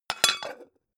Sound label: pan close